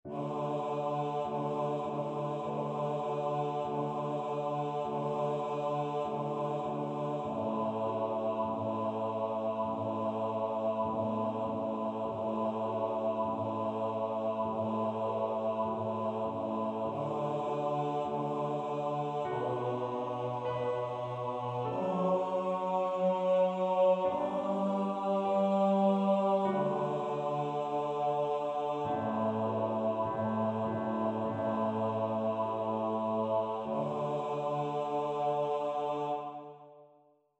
BAJO; accion gracias; Misa Brevis Jesus

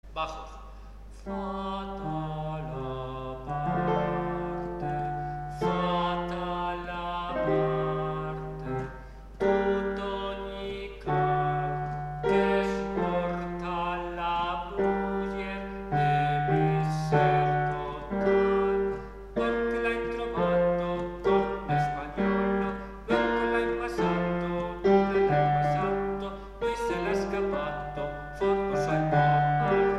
fata la parte bajos

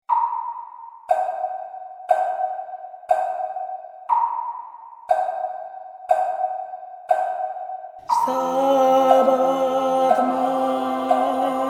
El santo entierro TENOR

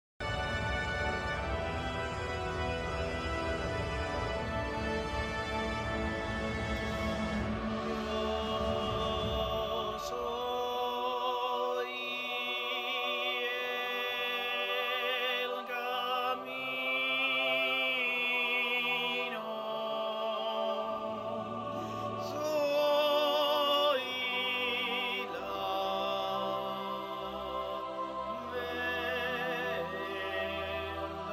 Yo soy la luz del mundo, tenor

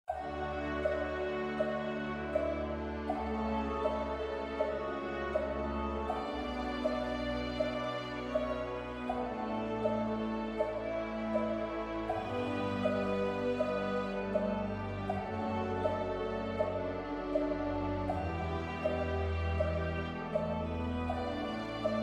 Al partir el pan te conoci SOPRANO

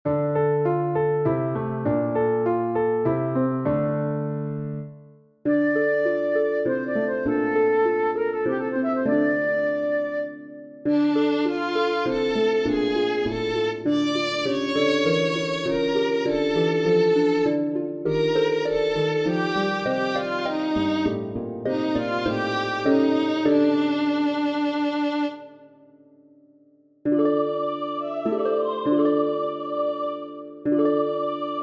Voz 1 Coro; 25 La flor del oro; Bodas de Sangre

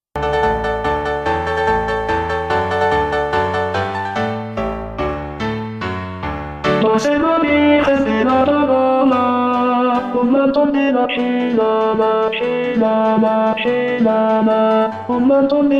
Verbena de la Paloma tenor; zarzuela chinchon; Seguidillas

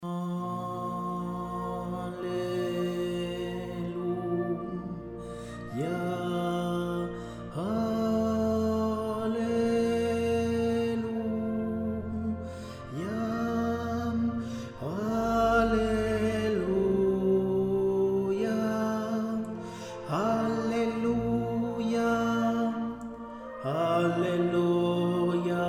TENOR ALELUYA

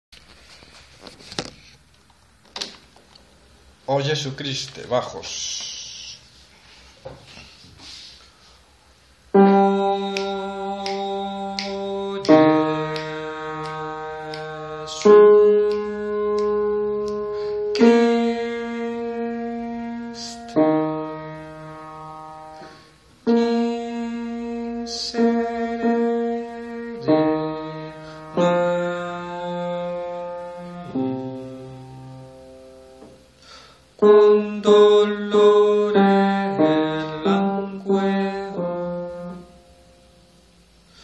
bajo

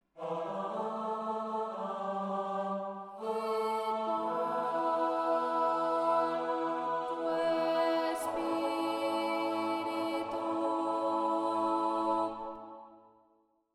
CONTRALTOS; 3. RESPONSORIO

